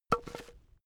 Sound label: wood staff drop stone